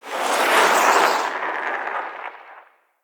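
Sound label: Siege Projectile Whoosh; Level Events; Midrath; Warsong Prologue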